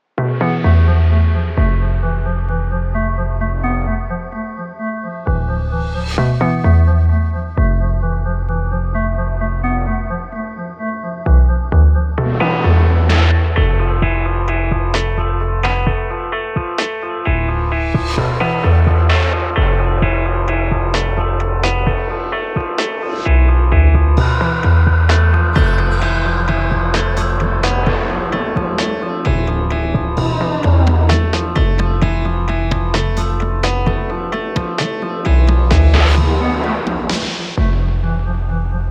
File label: angry trap; MUSIQUES CHRONIQUES